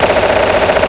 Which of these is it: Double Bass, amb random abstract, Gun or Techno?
Gun